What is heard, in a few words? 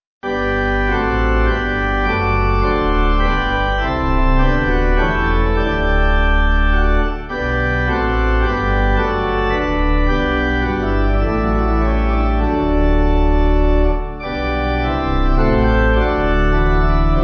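Pipe organ, church music backing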